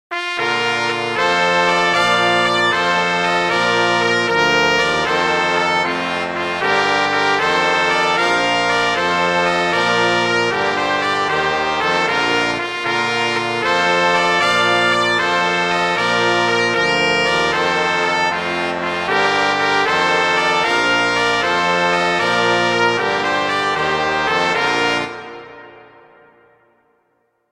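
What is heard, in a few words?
I Saw Three Ships, Christmas flute quartet; Christmas Brass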